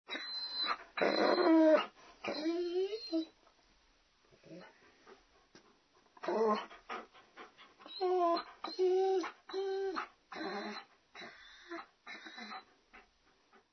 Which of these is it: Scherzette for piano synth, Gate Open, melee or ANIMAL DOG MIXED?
ANIMAL DOG MIXED